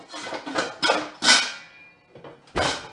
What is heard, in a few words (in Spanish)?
ruidos cocina